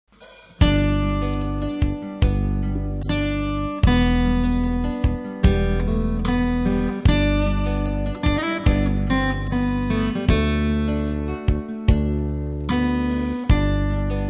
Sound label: soft guitar